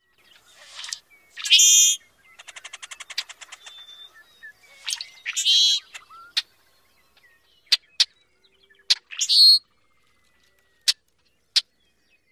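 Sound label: song and call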